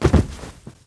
ICE HOUND DIE; Eft

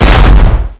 bullet cannon hit

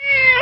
Melee HIT

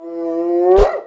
DEER HUNGRY; Eft

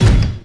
select Armour

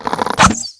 Arrow Fire; Weapon

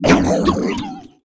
GREENTURTLE Die; Eft

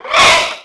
MAGIC; Eft; Dragon Baby

